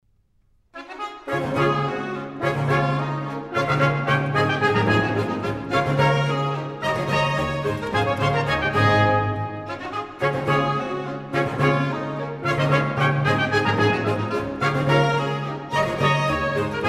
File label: Orchestral Suite 3 In D - Bourrée; Bach; BWV 1068